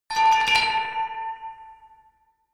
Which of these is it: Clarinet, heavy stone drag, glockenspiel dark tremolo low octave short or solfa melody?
glockenspiel dark tremolo low octave short